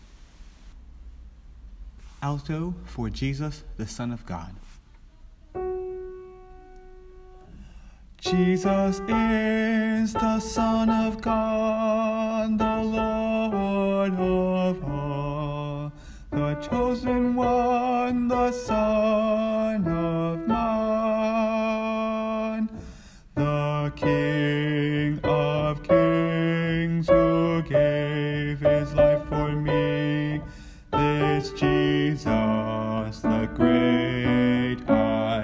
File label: Alto; Jesus The Son Of God